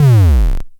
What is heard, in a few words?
BASS, Dual Percussion Synthesiser; Klone